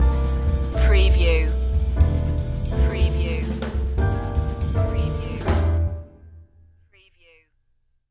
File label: swing stinger